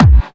Clubby Kicks, Bassdrums; Vengeance - Essential Clubsounds Vol.5

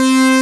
JUP8 PULS C4, Jupiter Synths; E-MU Formula 4000 Series Vol. 3; Analog Odyssey

